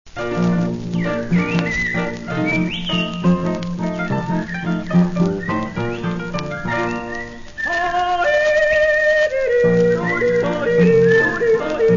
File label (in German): Bayerischer Dirndl Walzer